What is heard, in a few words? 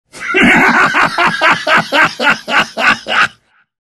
plance laugh; phantom lancer